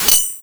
spike pop out; sonic cd

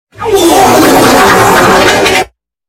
extra slow fart; meme

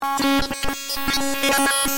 null glitch short

